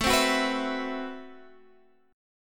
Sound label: A Minor 6th Add 9th A Guitar Half Step Down